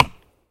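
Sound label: crackling knock on dry wood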